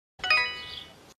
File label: Text Tone; Love island